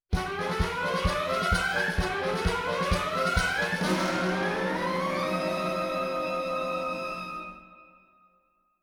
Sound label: MONKEYS EXIT